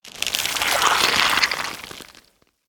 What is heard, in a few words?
Boneweb Egg Play